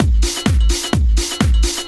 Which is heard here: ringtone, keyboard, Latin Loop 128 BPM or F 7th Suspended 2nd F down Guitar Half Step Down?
Latin Loop 128 BPM